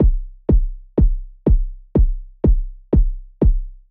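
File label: Bass drum Knocky C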